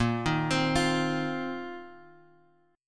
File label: Bb Suspended 2nd Suspended 4th, Bass Standard; arpeggiated